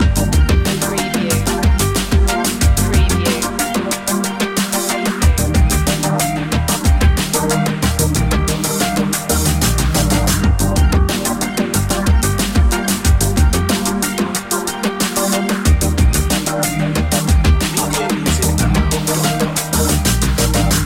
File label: technology progress loop